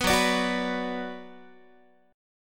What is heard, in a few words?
F sharp Suspended 4th Sharp 5th Guitar, chord; strummed; 14,14,17,16,15,x